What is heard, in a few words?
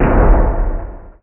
Collision Ext